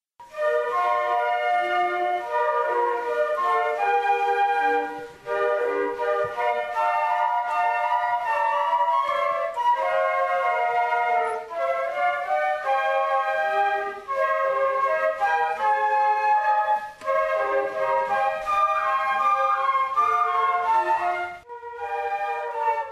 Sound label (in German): Querfloetenorchester